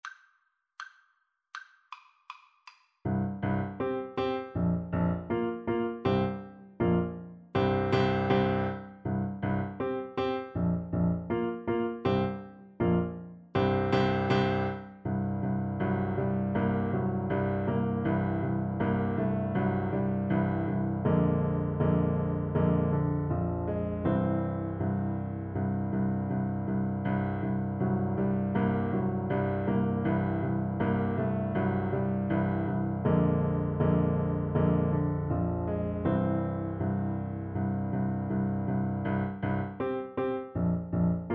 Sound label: thumping blues, Trumpet